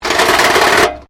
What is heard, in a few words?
METAL BINLID SCRAPE WIRE FENCE